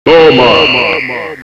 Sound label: headshot